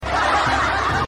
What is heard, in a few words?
funny laugh track